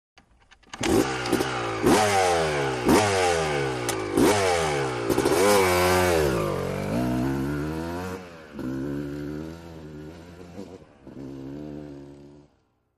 Motorcycle Motocross; Start / Revs / Away 250 Cc Honda, Starts Up, Revs And Drives Away, Shot Cu.